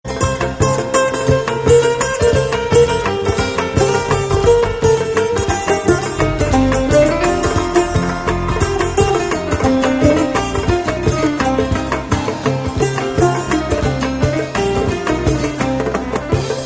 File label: stunning music